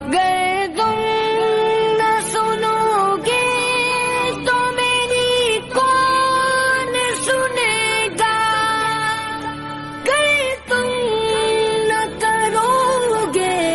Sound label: naat sharif islamic